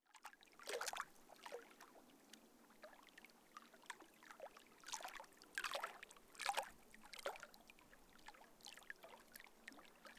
Lakeside Ambiance Sweden

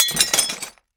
Breaking Dish

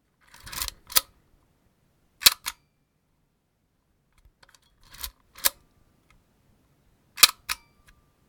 Old Camera Wind Up And Click

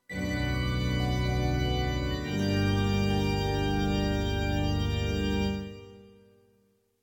Haunted Organ

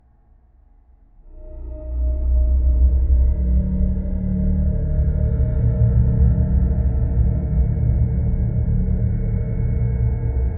Scary Horror Music

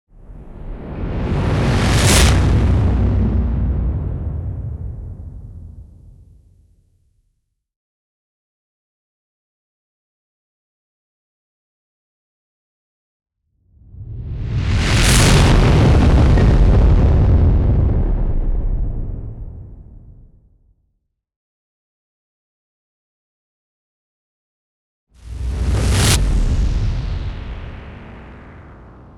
heavy whoosh swoosh low

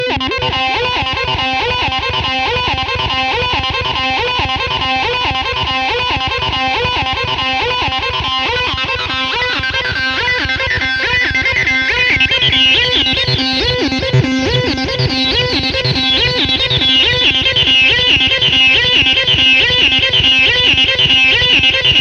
140 bpm 303 s filter

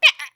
Weird Hiccup

